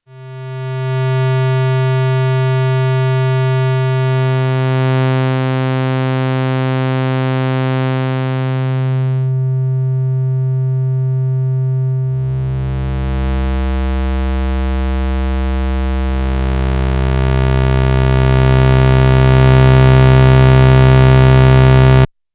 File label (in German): Oszillatoren